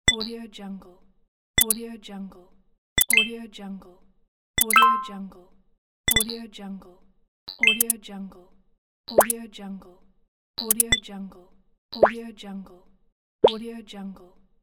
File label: vlog button